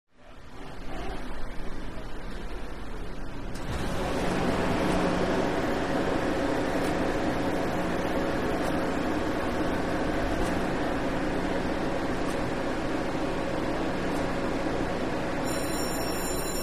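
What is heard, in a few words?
Interior Commuter Train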